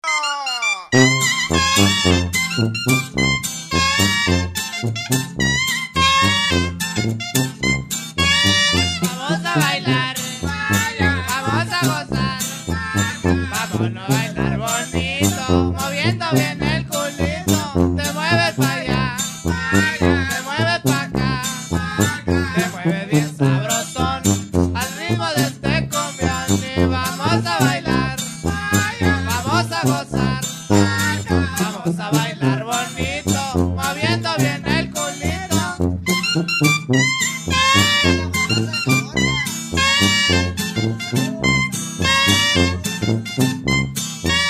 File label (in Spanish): La Cumbia